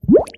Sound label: fish